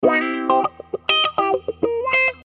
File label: Funk Guitars